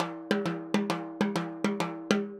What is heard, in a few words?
Timbali, Latin Percussion